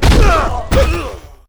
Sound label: Football Hit Tackle